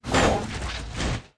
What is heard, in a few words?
damage, monster